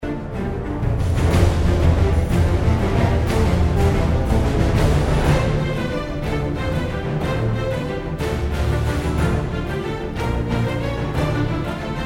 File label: orchestral